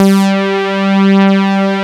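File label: DANCE SYNTH; E-MU Formula 4000 Series Vol. 2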